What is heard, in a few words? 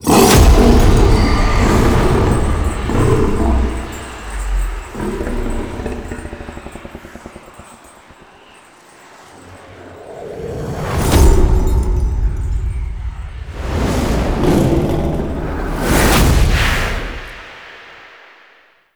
Stinger; Uriel; Fallen From Heaven